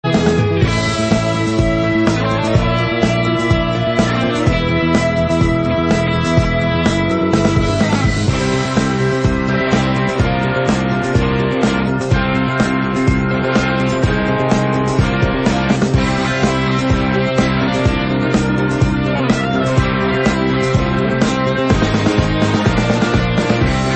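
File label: 70s rock version, C64 remix; Midnight Resistance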